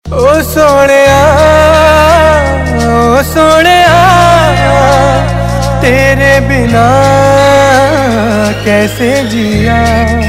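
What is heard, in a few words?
Ringtone, Bollywood Punjabi Pop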